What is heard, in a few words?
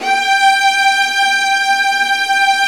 Violas Bright, String Sections; Roland LCDP13